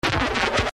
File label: needle